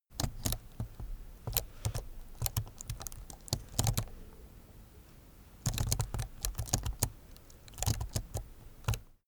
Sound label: keyboard laptop game sequence; kommunikation; computer